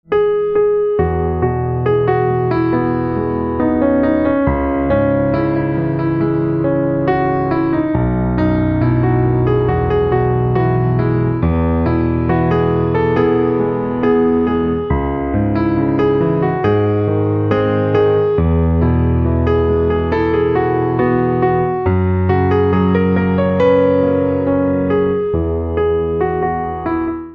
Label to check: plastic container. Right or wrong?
Wrong